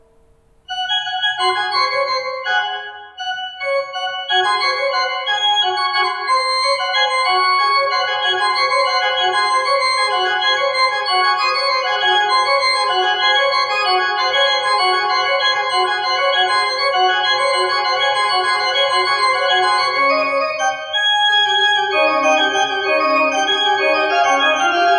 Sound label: Sixty-Second Organ Improvisation; St George's Hanover Square